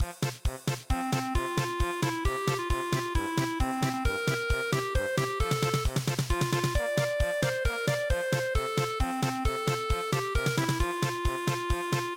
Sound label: stenkarajin polka